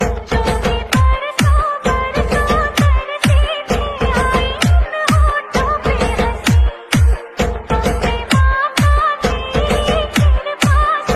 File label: Hindi Gana